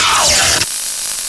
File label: ELECTROCUTED